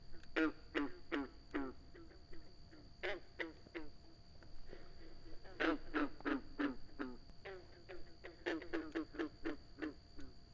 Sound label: Green Frog